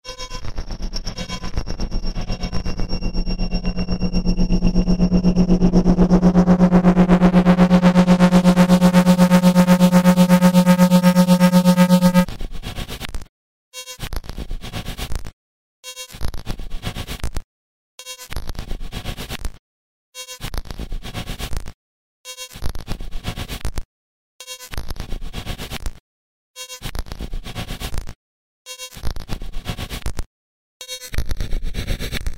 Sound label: static